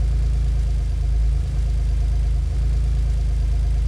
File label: car idle; Matt Hoffman's Pro BMX 2; XBOX